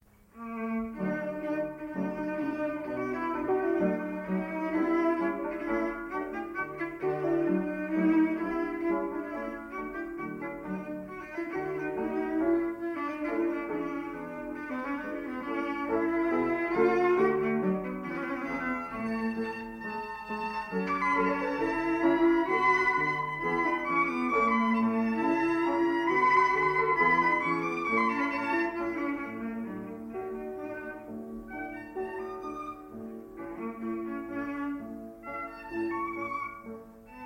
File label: trio; filtz